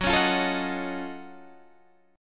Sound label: F sharp Minor Major 13th